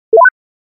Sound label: Digital button